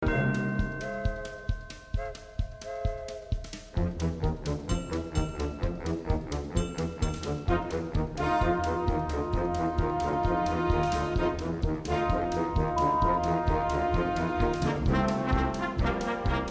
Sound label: show instrumental